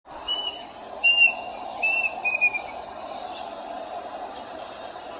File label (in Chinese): 八聲杜鵑